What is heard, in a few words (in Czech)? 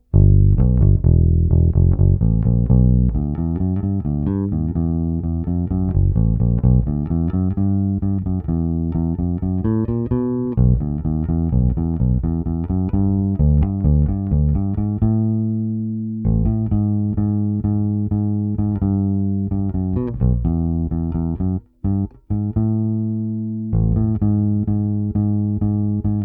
Jay Turser Precision bass, La Bella - clona 50%; JTB-400C-BK-A-U